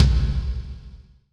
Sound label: TOM, percussion reverb; MARKYDRUMS